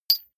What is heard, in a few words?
medium metal impact medium short